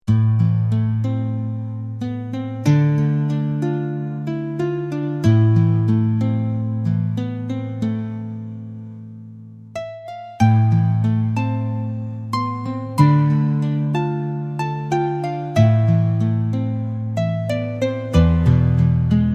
whispers of the river, afrikanskaya

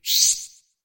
content popping after opening, konservov banok

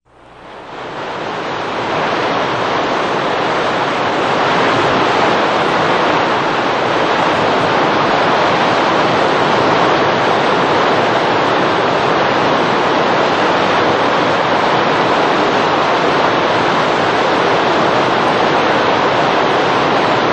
waterfall noise; shum vodopada

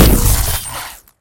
launching a projectile from a laser system; lazernoe orujie

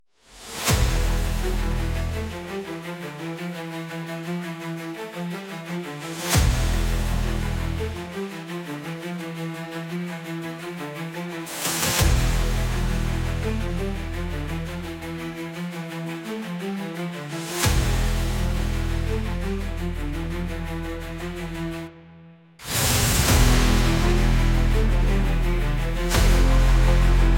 epic bass odyssey, bass music

